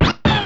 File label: music note; super mario paint